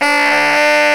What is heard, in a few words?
SAX T. GROW, Tenor; Roland LCDP07 Super Sax